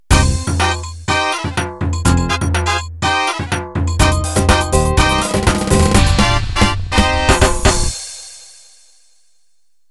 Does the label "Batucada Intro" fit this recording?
Yes